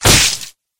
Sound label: hit crossbow